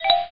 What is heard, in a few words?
ICQ MsgSent